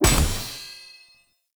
Impact Proc; Procs

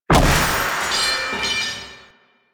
Sceptre Drop; Royal Guard; Foley

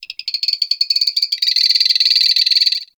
Ext Insect Close, Ambience

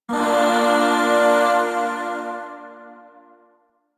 Shawzin Min Chord J; Music; Chords; Warframe; Zariman Void Shawzin; DE

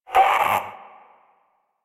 Possessed Red Veil Vocal Pain; Enemies; Warframe; Priest Quest

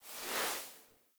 Foley Idle Stand; Enemies; Kubrow; Warframe

